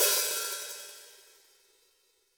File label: HiHat & Crash, Roland TD-20; HiHats